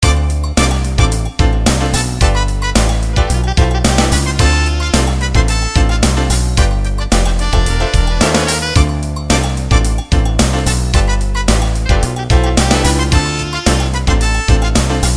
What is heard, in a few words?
Scene Transition